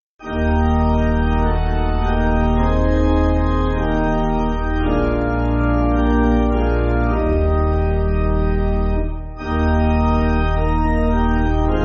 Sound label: Pipe, church music; Like As A Father; Beldon